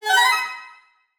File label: start; pinball; Vital Garden